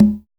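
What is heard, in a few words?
jungle conga; Drums In Your FACE!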